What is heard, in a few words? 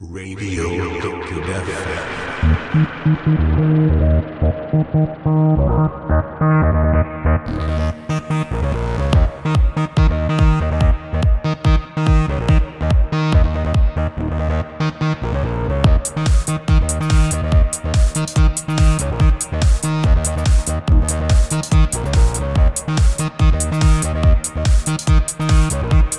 minimal; Avoid Sensory Overload